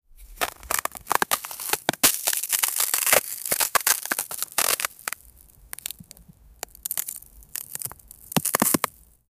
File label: ice cracking splinterin